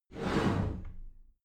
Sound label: cupboard slide door slide heavy; haus-garten